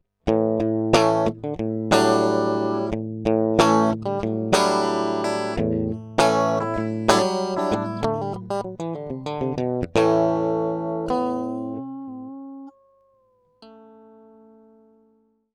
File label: solid-state and hi z